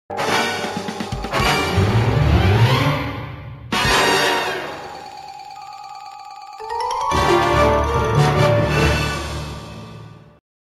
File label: Battle End WIN; Plants vs. Zombies Heroes; Android iOS